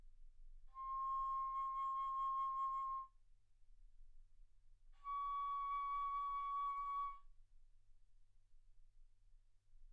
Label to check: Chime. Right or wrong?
Wrong